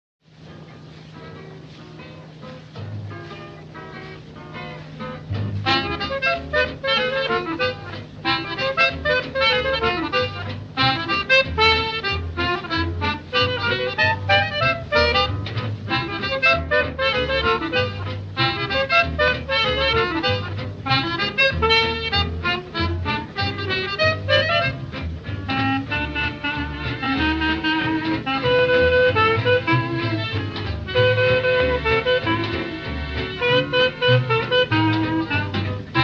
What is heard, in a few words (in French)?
Swing Guitare